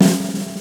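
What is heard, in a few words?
snare roll lv, Yamaha Motif ES drum machine; 300 Drum Machines; Yamaha Motif ES-Series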